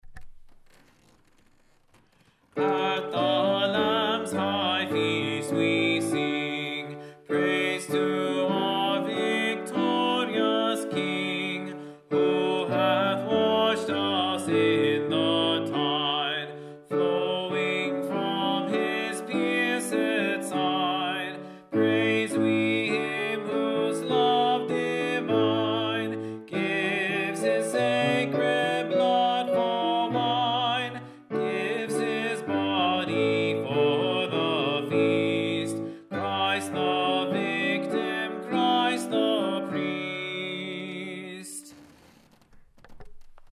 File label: Tenor; At the Lamb’s High Feast; Selected Gregorian Chant & Hymnody